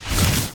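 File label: armor, movement; smite; heimdallr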